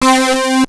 guitar; Descent

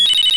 Computer On; Missions of the Reliant II

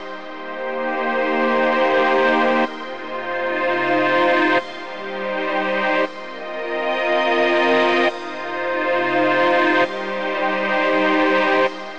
SYNTH PAD AMBNT 160 C; Ambient; Sphere

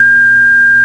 SINE

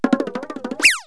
feet whiz, cartoon twangs

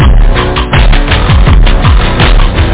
PIANO

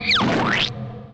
Sound Ogre; The Interactive Alphabet

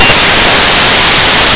Concert Burst

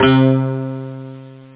12 string; Sounds Terrific II; Weird Science; Amiga

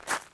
gravel; The Sum of All Fears

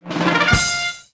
correct; AANIMALS

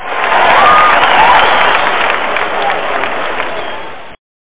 Crowd cheering; Human

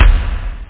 reverb k, perc, kick; OctaMED SoundStudio V1; jazzies samples

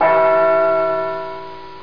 Bong

